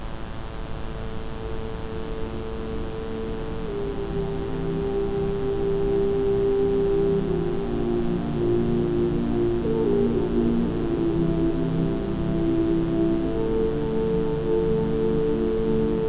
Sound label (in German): Rife Subatomare Stille mit Ambient Sound; rife frequenzen